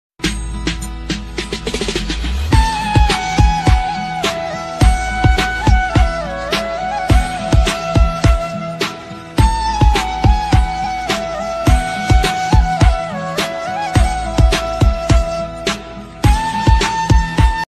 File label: durga puja ringtone maa durge ringtone bgm